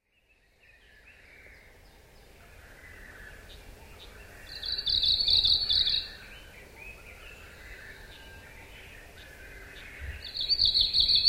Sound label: arctic warbler